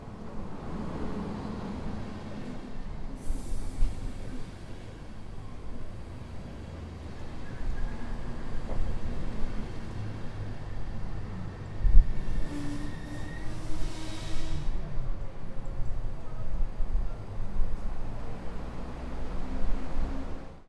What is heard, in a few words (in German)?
Kleiner Zug